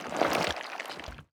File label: death drown; smite; poseidon